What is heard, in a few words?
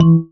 marimba raincoat; ゆめ2っき